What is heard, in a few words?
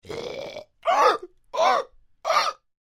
Creature Troll Gasps, Monster; Monsters; Horror